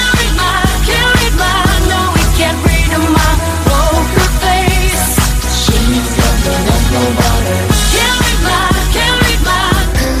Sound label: electro ringtone